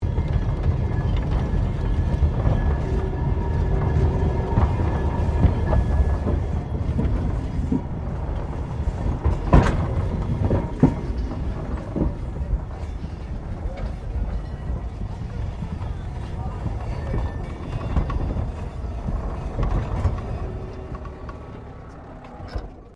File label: Tram Cable Portland 1800s Shunting; Portland Cable Tramcar; Streetcars; Vehicles